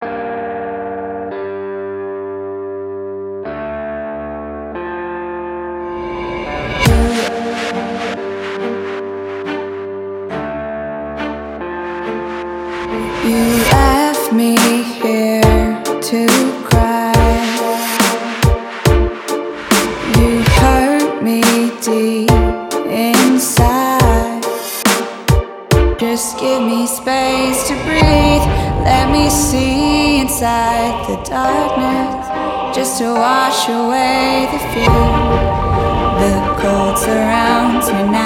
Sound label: Full Vocal Mix